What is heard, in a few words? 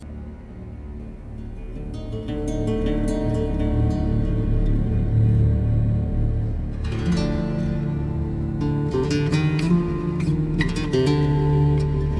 guitar theme